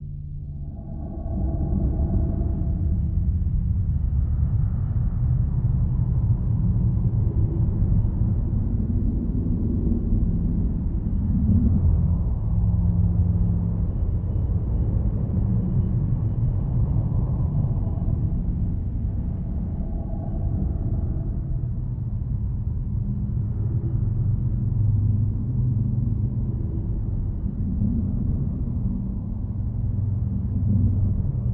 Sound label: Menu Ambience